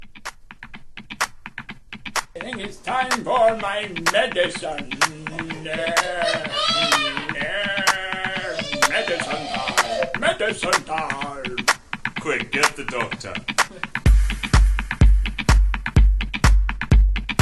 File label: Minimal